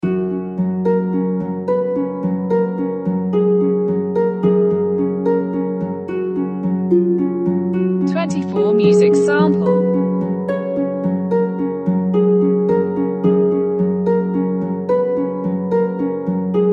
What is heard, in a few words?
harp